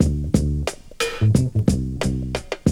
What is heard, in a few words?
bass loop; AMG; Now CD-ROM (Roland)